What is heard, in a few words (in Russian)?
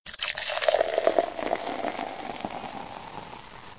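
разливают шампанское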